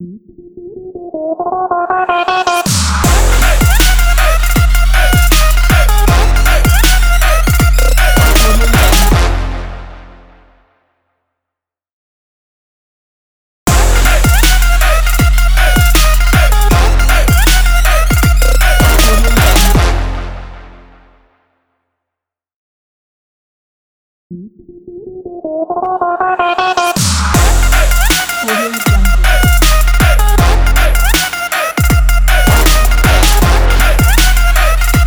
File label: trap hiphop energetic electronic logo